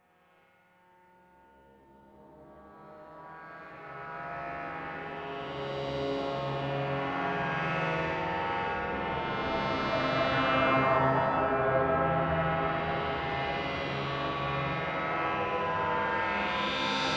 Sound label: drone; feldspar; thunderbungler; dronelord